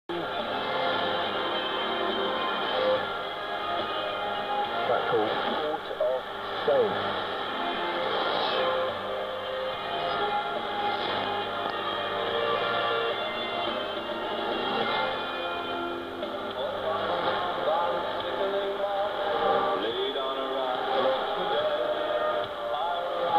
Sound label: 3932 kHz, pirate radio; bogusman